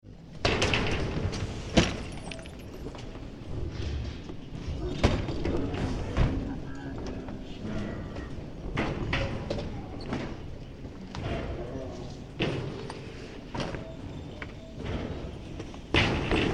Airport Baggage Collection Cases Of Chute Onto Caracal; Tom Hutchings